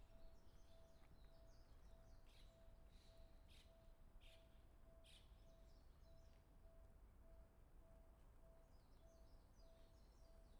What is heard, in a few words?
Stereo, walk; Fild recrding walk